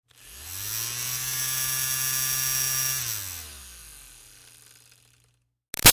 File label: Fan Small Handheld Run Short; Electronics